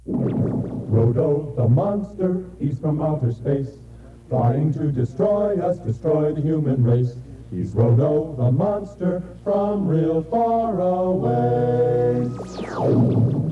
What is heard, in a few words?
theme song